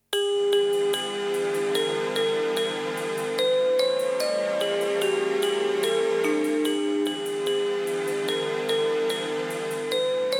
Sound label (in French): VERSION KARAOKE; Alex et Zoé 1